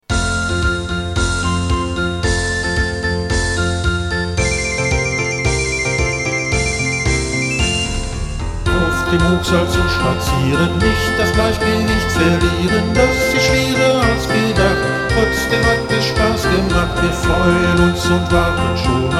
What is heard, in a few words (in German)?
zirkuslieder